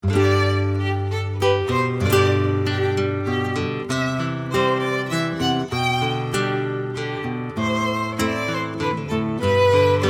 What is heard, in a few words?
Minuet